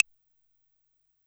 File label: D#5 Analog Claves, Dance Kit